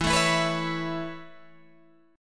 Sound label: A Major Add 9th E Guitar Standard, chord; Aadd9/E strummed; JGuitar